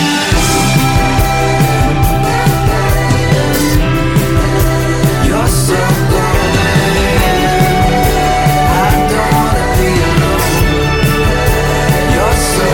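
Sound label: guitar english music ringtone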